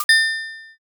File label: register